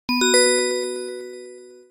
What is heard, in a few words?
Glitter; ACE Clever-1